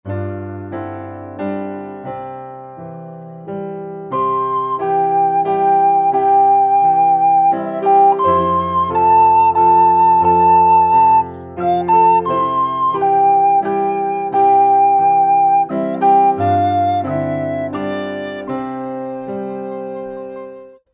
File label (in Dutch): Knikkeren 1e stem